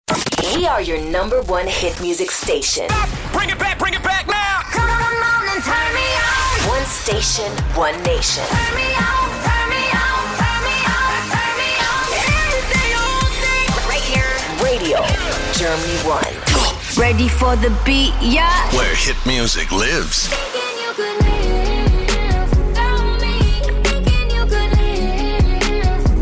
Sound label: Jingles; Radio Germany One